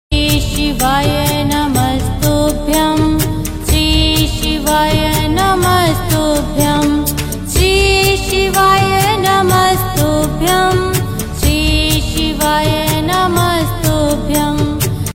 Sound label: Shree Shivay Namastubhyam Mantra; Mobile Ringtone